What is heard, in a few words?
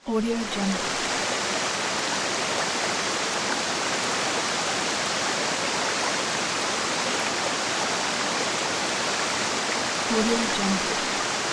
waterfall